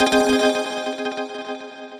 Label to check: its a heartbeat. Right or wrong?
Wrong